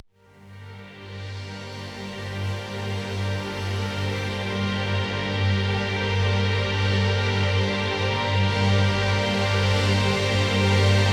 Pad G

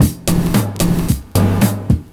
PERCS, 70 s Breakbeats

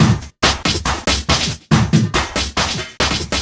LoFi Beats, 140 BPM

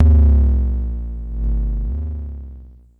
KLONE BASS MOD, Klone Dual-Percussion-Synthesiser; Drum Machines

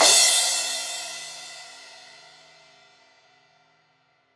Crash 16in edge, Cymbals

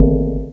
perc; Mono

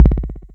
Kick Delay, TR-606 Bent Samples; Sonic Foundry (Sony Creative Software); The Electronic Music Manuscript Collection; Battery 3 Sample Kits

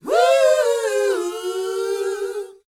A#B, Vocal, DANCE 120; ILIO; Vocal Planet VOL-4; R&B & Dance

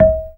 GAMBANG D#5; Spectrasonics; Heart of Asia

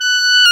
WND CLAR, Clarinet Sh

